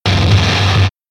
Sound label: impact